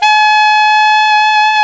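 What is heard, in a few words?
SAX ALTO mezzo piano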